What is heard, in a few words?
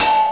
bell; xfce